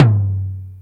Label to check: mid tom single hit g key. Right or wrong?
Right